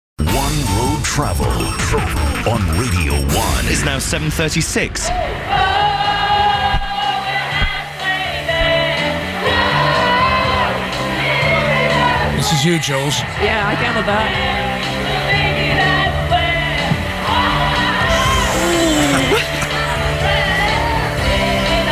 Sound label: karaoke